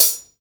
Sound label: Open Hihats, Cymbals; Vengeance Samples